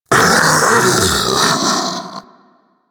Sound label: Infested Splitter Split Vocal Death; Warframe; Grey Strain